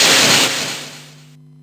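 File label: magic thunder; Etrian Odyssey I HD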